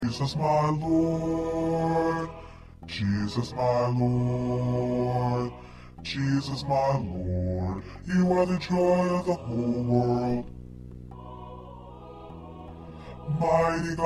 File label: Bass